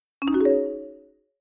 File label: New Message